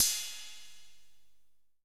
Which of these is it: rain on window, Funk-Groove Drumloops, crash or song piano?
crash